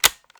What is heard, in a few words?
Button